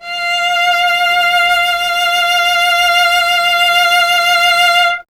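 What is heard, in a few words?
strings cello F; Miroslav Vitous; Solo Instruments VOL-1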